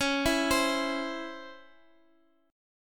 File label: C sharp Minor Major 7th C sharp Guitar Half Step Down, chord